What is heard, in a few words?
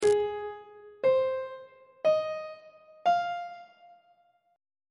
g sharp major 6th chord intervals on treble clef